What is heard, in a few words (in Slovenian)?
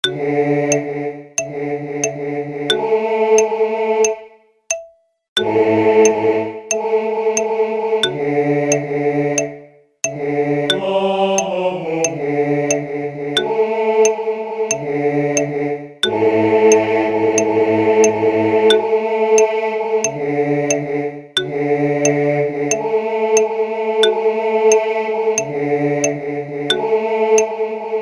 Z Bogom Marija pravi BAS; Zbor; MOSTE; Z BOGOM! DANS MARIJA PRAVI